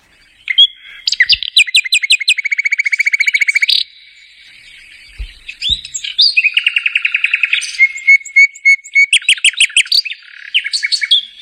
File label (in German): Nachtigall, Vogelstimmen